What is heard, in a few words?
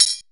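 Tambourine, Roland S50 Percussion; Drum Samples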